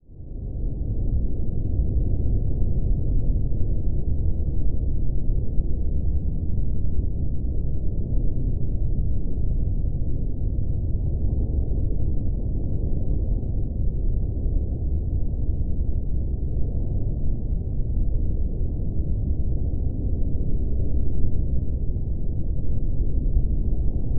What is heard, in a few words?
Underwater Water Ambience